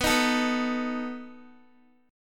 B 7th B down Guitar; strummed

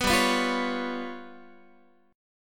G 7th Flat 5th, Guitar; G7b5 strummed

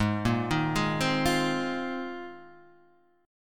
Bb 7th Suspended 2nd Suspended 4th Ab

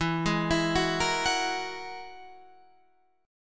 G sharp 7th Suspended 2nd Sharp 5th

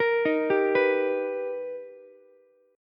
B Augmented Major 7th B Ukulele, chord; B+M7; arpeggiated; JGuitar

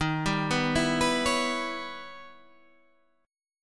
E Minor Major 13th D sharp, guitar

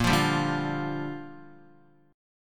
Bb 6th Bb, Guitar; Bb6 strummed

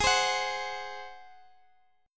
Ab 7th Ab down Guitar Open D

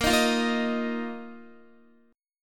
A sharp Suspended 4th Guitar; A#sus4 strummed; x,13,13,10,10,10

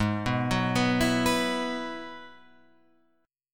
Ab Minor 7th Sharp 5th, Guitar, Open F; arpeggiated

